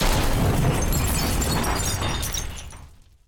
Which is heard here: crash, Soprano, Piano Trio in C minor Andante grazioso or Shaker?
crash